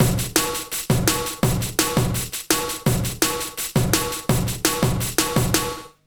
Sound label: 166 BREAK, Jungle; USB Soundscan vol.05; AKAI; 90s sample CD